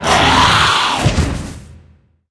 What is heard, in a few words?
blaze hound dead, monster